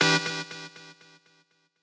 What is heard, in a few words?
stab